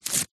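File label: household instructions pamphlet glossy paper rip; zapsplat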